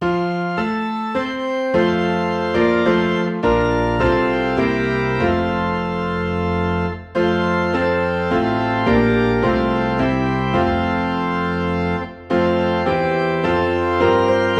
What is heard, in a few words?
Piano and Organ in F